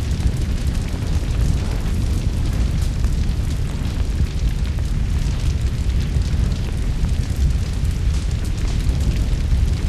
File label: fire loop big flame; colony; Titanfall 2